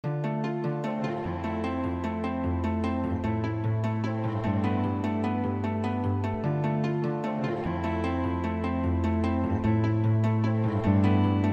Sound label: Melodic Future Bass; Vanilla Groove